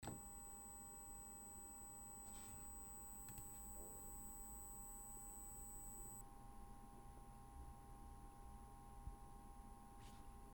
audio parasite, 8 khz static noise in my audio recordings